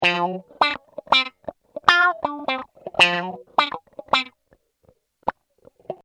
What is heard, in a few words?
wah